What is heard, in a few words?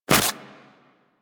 Armadillo Dig Snow